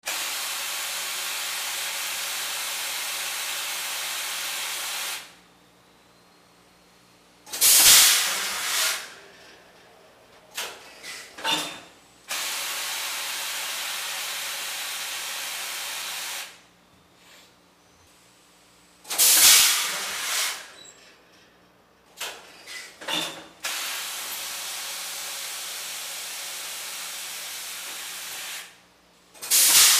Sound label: Steam Press Bursts